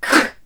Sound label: NOISE, CLASSIC VOX; Best Service; Hallelujah